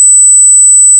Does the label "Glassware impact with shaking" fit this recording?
No